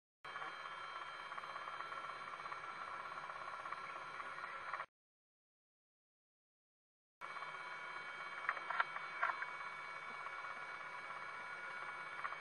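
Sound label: honey buzzard flight calls; hexhamshire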